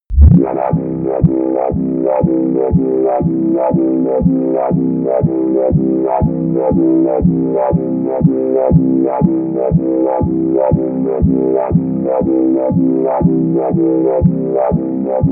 wobbling bass

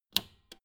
old button press jump out

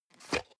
plastic can small lid screw single open

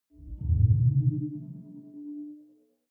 sci-fi display transmission submerged

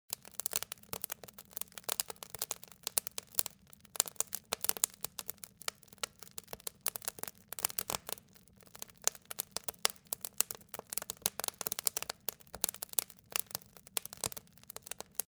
campfires

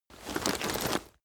leather metal handling short

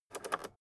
rotary control full turn fast

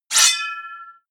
sword pull sword knife out

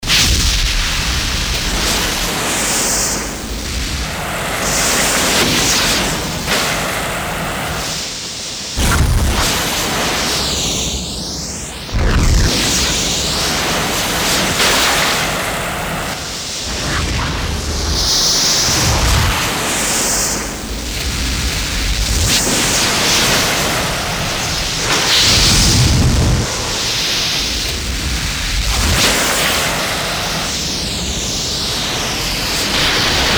explosive phasing swoshes

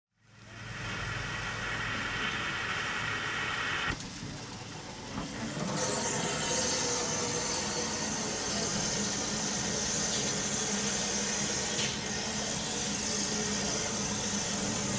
old train lett off steam

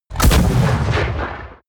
ballista fire

